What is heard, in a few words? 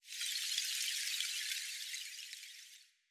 Pollen Spell